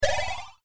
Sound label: power up